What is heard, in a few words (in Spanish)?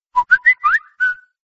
Silbido Whatsapp